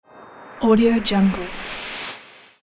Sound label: cinematic riser